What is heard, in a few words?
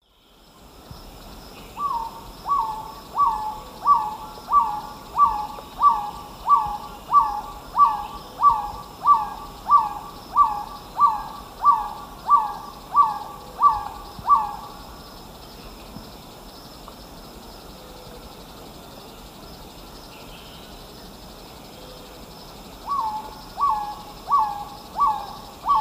Trogon melanurus, song male; S. America; Eastern Lowlands